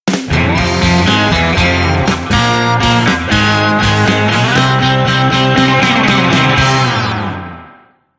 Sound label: Sting Win; Cars 2 The Video Game 2011